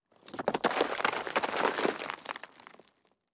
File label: debris; World of Tanks Blitz; ambient halloween 2018